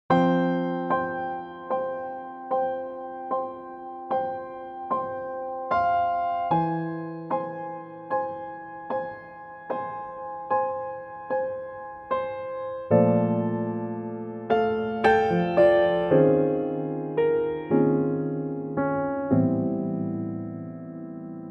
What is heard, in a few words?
F piano trak